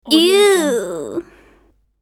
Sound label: girl disgusted